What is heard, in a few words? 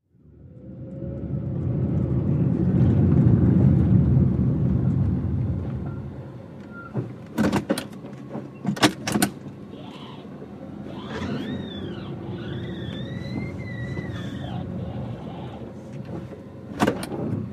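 Small Car Int Park Manvr, compact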